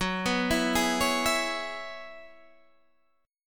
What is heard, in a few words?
Gb 7th Suspended 2nd Suspended 4th, Guitar, Open D